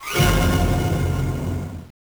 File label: Orchestra Hit, Genesis; ToeJam & Earl 1; M8